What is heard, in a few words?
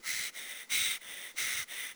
critter idle breathing loop; nightmare criters